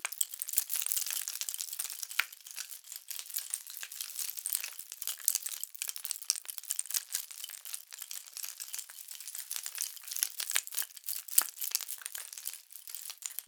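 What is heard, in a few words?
rattlebone, percussion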